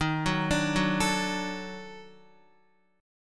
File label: Eb Minor Major 7th Flat 5th Eb down Guitar; EbmM7b5; arpeggiated; JGuitar